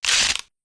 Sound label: scrunch